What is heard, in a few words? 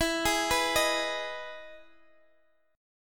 E Major 7th E; x,x,14,13,12,11; arpeggiated